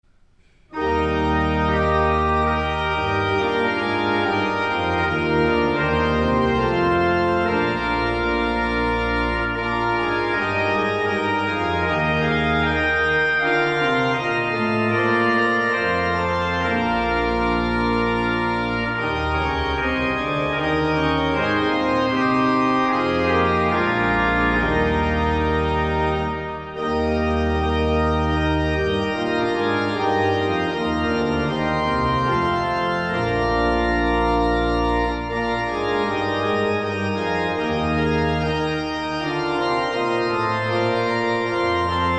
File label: Organ; J S Bach; Wachet auf; St. John's Cathedral, Jacksonville Florida